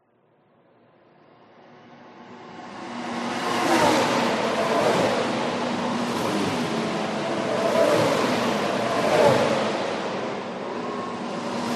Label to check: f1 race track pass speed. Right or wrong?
Right